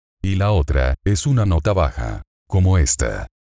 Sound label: Tone Non Target